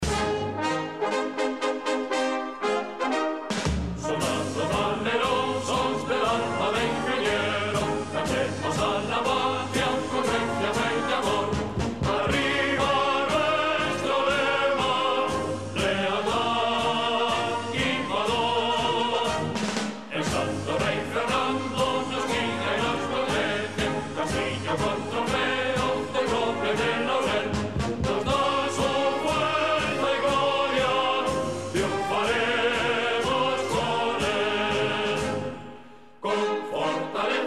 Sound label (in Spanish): himno ingenieros